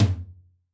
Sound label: Room2 Lo, Tom, Yamaha DTXpress-II